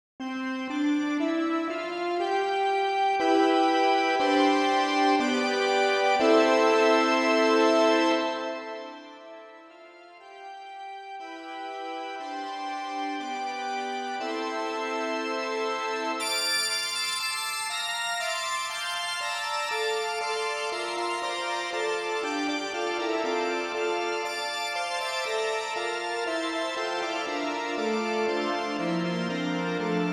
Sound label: Analog Orchest Simple Cath Org Golden EP bright; VE PRO; XPand!2